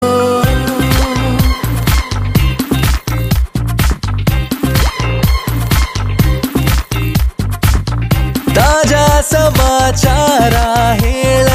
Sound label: Male Ringtone